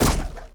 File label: Rope Cut